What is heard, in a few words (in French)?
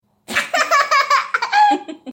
Rire bebe